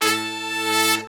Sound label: BRS SHRT, R&R Horns, Sect; Roland L-CDX-03